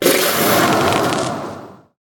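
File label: Froster attack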